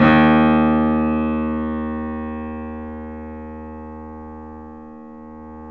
D# -R, The Piano; InVision Interactive - The Piano

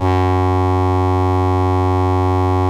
LAMBDA, String Machines

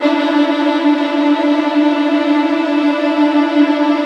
WH TR D4, Violin; Q Up Arts; Denny Jaeger Master Violin Library; PERFORMANCE

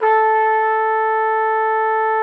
TROP A4, TRUMPET; Xsample VOL-3

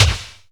Strange Kicks, Percussion; Dance Synths

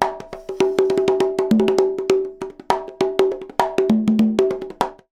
CONGA, Modern Percussion Loops

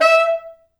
E4, Pop Brass; Best Service ProSamples vol.19; AKAI

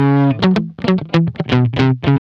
GUI, LICKS; 110 LICKS

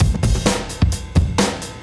Beat Style A 130, breaks; 130bpm

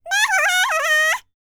BLACK SEA, World Voices; ILIO; Vocal Planet VOL-5

